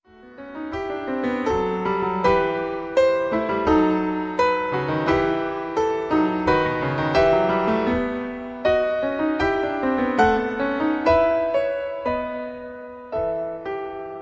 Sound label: fugue in e minor